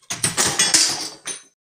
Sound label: metal falling; wood metal SFX